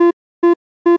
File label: countdown; lovr; Break